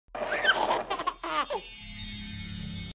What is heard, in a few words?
shutter click